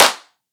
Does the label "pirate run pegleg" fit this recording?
No